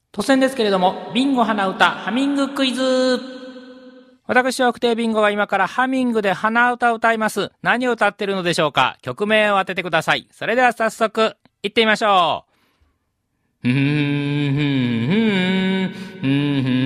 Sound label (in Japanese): hanauta